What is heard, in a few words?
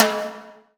HI TIMBAL; EdgeSounds; Drum Mashines; R-8 DRUMS